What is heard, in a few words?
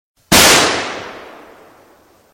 car backfire musical